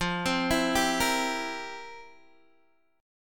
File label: F Major 7th Suspended 2nd Suspended 4th, Guitar, Open G; down; chord shape